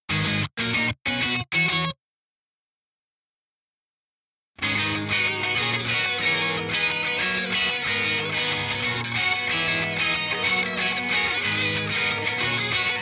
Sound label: GTR mix